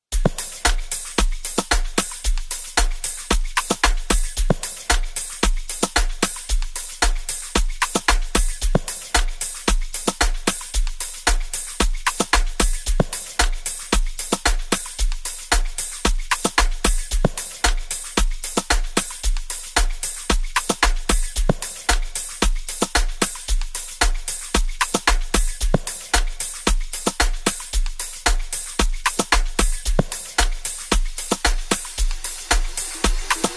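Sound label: Log Drum, Amapiano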